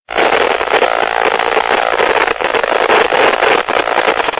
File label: Static Tones